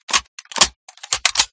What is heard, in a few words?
vector reload, guns